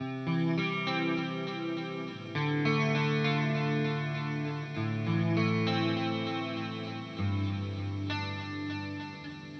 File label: loop 100 bpm